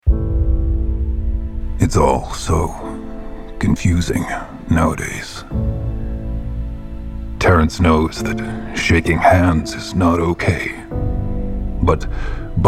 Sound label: SNEEZING INTO BUMPING ELBOWS